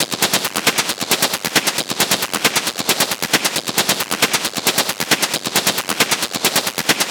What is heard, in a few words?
Perc Loop 135, Techno